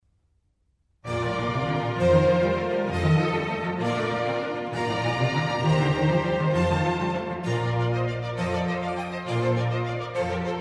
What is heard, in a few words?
Concerto for Strings in B flat major; RV164; Antonio Vivaldi